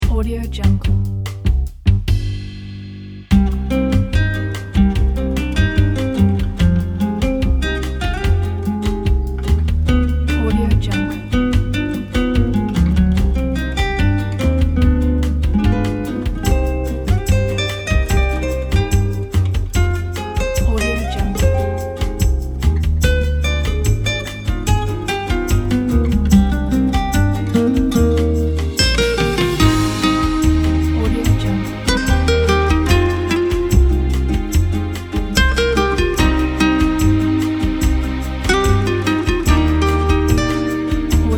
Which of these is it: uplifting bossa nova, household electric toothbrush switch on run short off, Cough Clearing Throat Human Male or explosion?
uplifting bossa nova